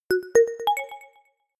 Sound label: notification short bell melody